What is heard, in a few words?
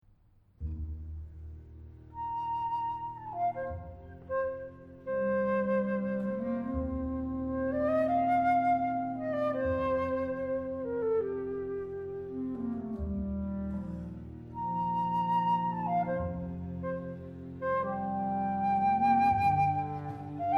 flute conc